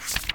page; OneShot Fading Memory

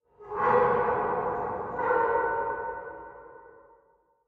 metal creak, Mines; AMB; OneShot Fading Memory

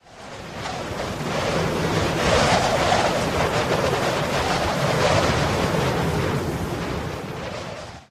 high altitude; OneShot Fading Memory

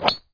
driver hit strong

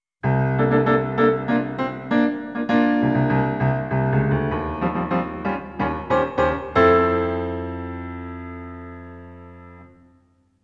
Castle Clear Fanfare; NINTENDO

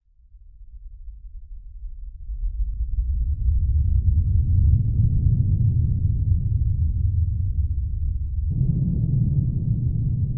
wind, Mines; AMB; OneShot Fading Memory

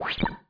player browse

